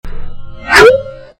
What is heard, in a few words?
notification